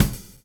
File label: groove; AMG Gota Yashiki Groove Activator